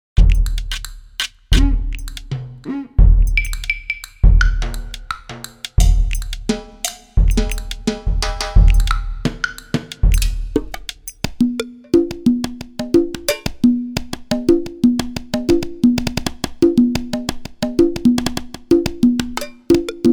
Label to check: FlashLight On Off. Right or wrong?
Wrong